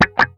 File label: Guitar Wah Dry; pitchglitch